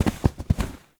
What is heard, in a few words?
Foam Block Impact; Warframe; Ambience; NineteenNinetyNine